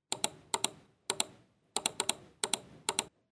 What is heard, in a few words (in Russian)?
klik myshi zvuk